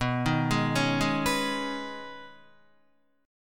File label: B 6th Add 9th B Guitar, chord; B6add9; arpeggiated